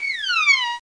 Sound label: Slide